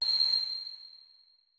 notes, keyboard strings; Hideout Furniture

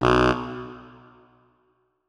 notes, saxophone; Hideout Furniture

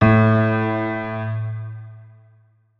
notes, keyboard piano; Hideout Furniture

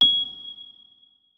notes, keyboard, electric piano

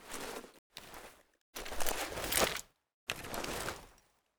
inspect, weapons spas; Spas-12 Reanimation

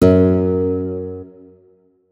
notes, guitar; Hideout Furniture

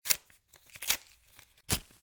rgd6 set, Smoke Grenade; RGD-2 Smoke Grenade; weapons

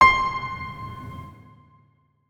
notes, keyboard piano; Hideout Furniture

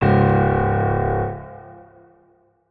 notes, keyboard piano; Hideout Furniture

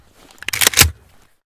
vihr unjam, weapons; Blindside's Weapon Reanimation and Rebalance - Military

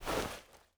bino draw, weapons; Binocular Renaimation

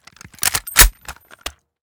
svu unjam, weapons; Blindside's Weapon Reanimation and Rebalance - Military

